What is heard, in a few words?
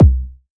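CLUB bass drum, CLUB KIT; Wizoo - Platinum 24 Electronic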